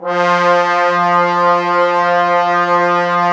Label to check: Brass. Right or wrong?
Right